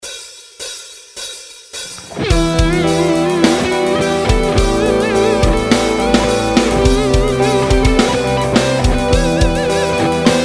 Improv One String Only

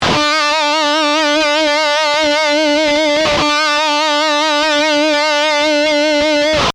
Instant Vibrato, guitar solo

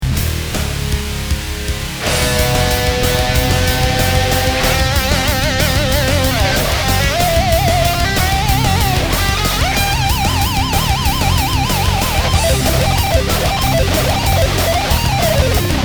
Metal Shred Guitar Solo

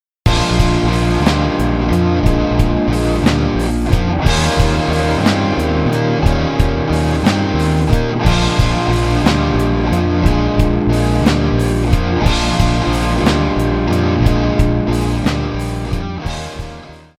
Rock Tracks

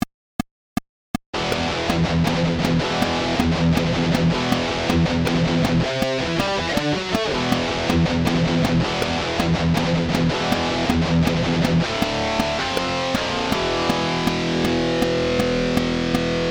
Rhythm

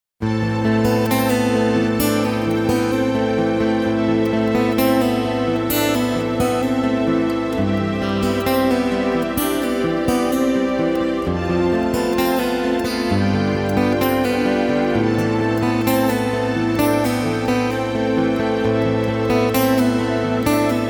Rock Tracks

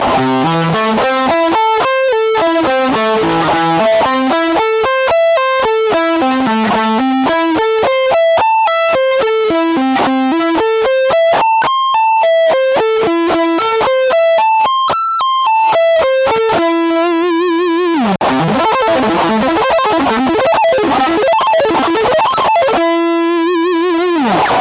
Creative Arpeggios Example

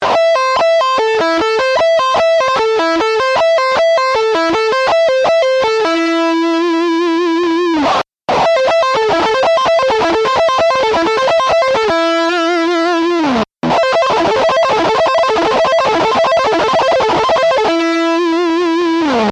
Double Accent

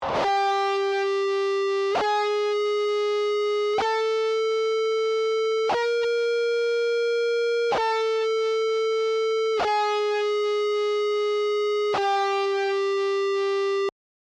Synchronization Practice Good

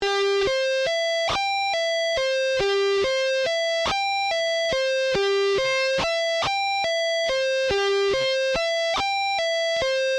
C Major, Sweep Picking

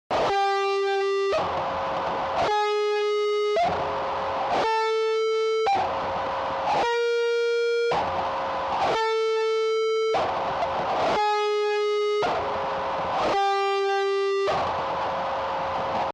Synchronization Practice Bad

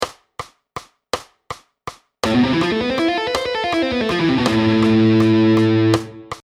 Hirajoshi Scale

